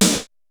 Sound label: ECHO KIT2; Rubber Chicken Software Sample Bank; DRUMS + PERC